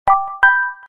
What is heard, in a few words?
Samsung S23 Charming Bell